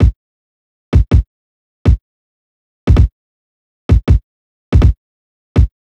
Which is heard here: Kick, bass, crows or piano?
Kick